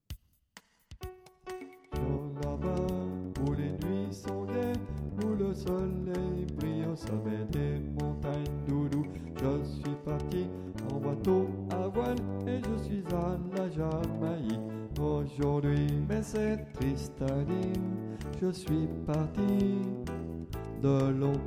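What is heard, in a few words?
bass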